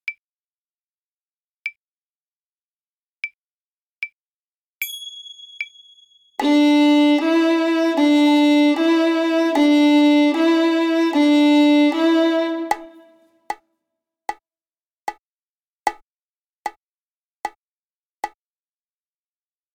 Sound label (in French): Jeu du perroquet sur la corde de Re Violon